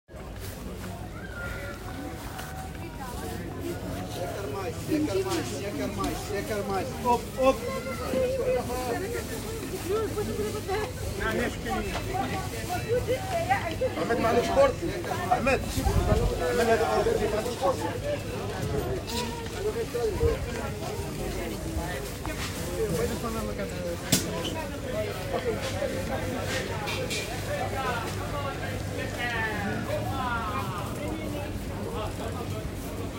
Market + lekker mais, Rotterdam Field Recordings

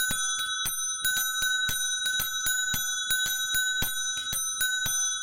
Bells

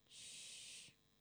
longer shhh

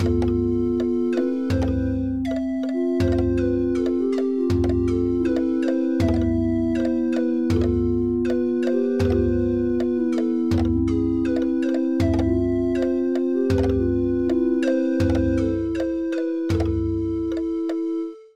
Single Panflute

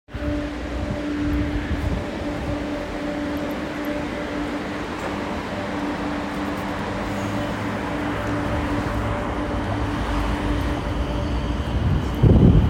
Trainstation Schiedam, Rotterdam Field Recordings

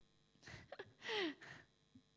bigger laugh

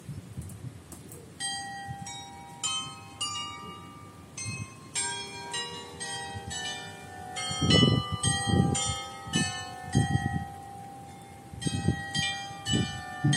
bells; Rotterdam Field Recordings

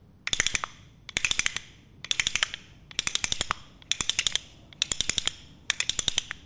Clappy Thingy

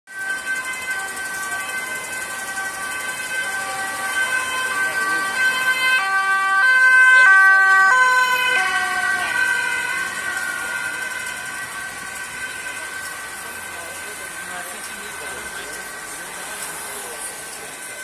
ambulance, Rotterdam Field Recordings

